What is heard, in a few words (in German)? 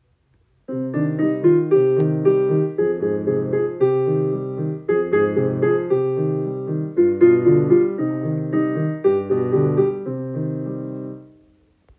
Entchen Moll